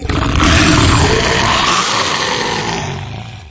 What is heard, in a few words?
Dungeon Ghoul Growl